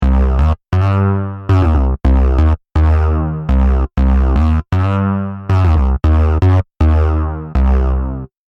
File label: Eight Bit; PUREMAGNETIK; Micropak Eight Bit